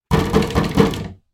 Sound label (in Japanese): 木扉をノックされる音; door